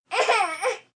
Baby Shoft Cry, Baby fussing, 1 yr., short, sounds fake, human voice, kid, child, infant; Talking Sound Effects; Sound Effects:Voice; VoiceBox